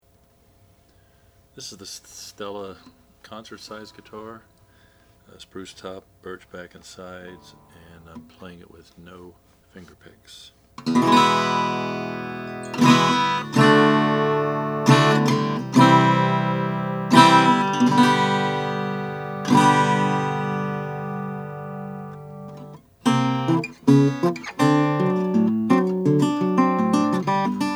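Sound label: oscar schmidt stella sunburst, Guitars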